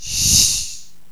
snake attack; snakes

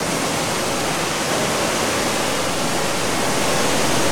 Cave Waterfall

